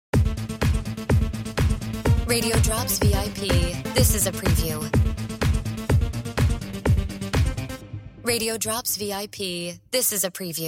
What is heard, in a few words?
Music Bed